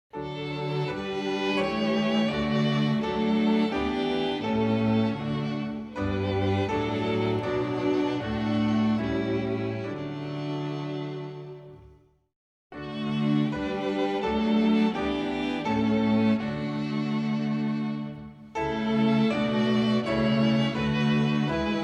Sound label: hymn instrumental